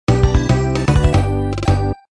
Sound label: win